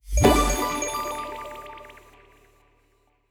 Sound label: win Small; netent